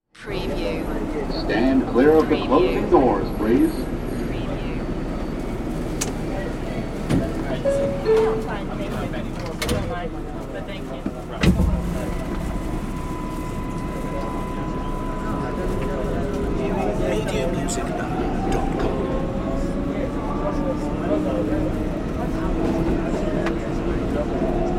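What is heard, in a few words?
Subway Ride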